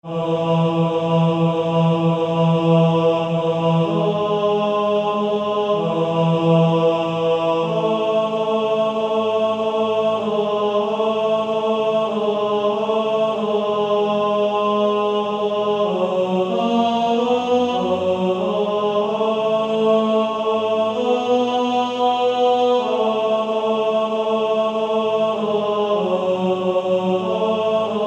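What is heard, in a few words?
Male Choir, tenor 2; TTBB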